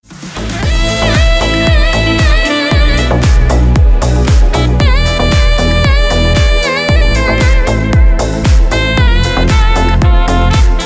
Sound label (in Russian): saksofon melodija; Саксофон Мелодия